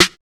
Open Snares

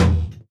Tom

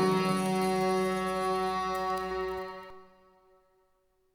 String FX

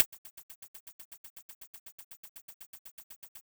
Closed Hats

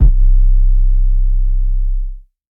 808 C; 808s

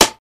Snare

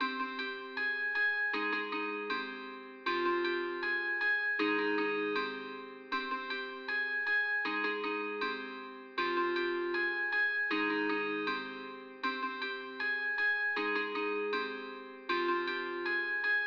157 c minor Omnisphere

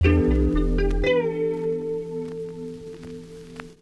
Loop

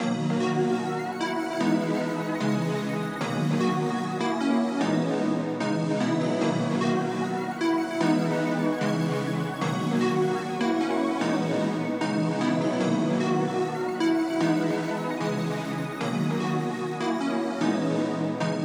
melodic melody

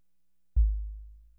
808s

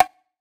Perc

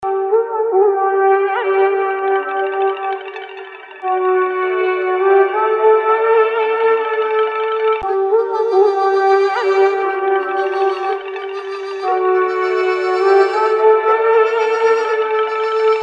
120 BPM

